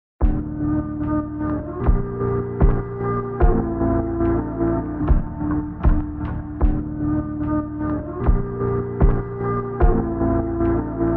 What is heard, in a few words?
instrumental ringtone